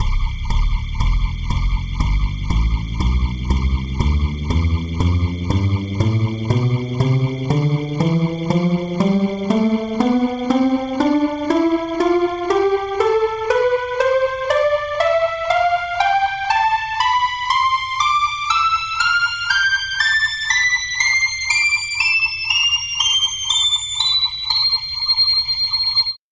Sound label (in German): calliope lead tonleiter